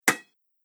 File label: Single Bounce Ball On Concrete, basketball; Foley; Andrew Fleming